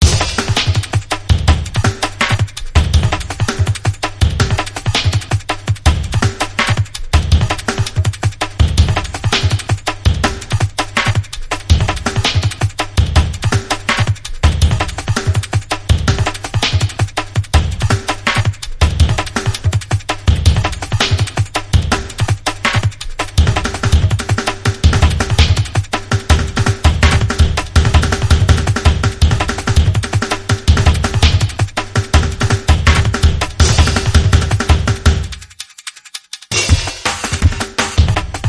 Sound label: Percussion; Percussion Edit